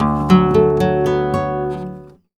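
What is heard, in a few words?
arpeggio guitar, Flamenco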